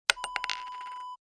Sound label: bottle cap drop